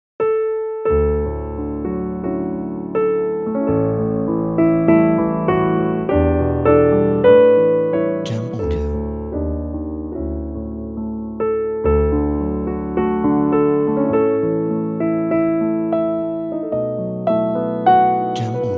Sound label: America Patriotic Song